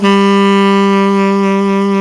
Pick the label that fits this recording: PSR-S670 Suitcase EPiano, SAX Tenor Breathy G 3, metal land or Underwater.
SAX Tenor Breathy G 3